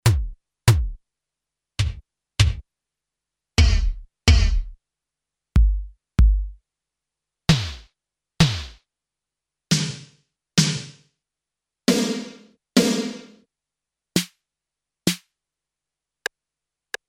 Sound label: r8 808 snd